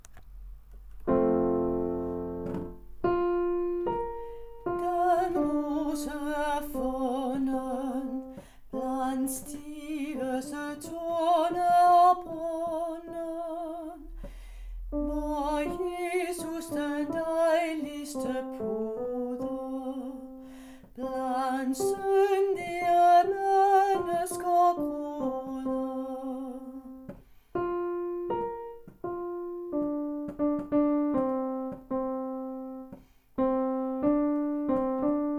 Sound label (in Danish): Den yndigste rose er funden ALT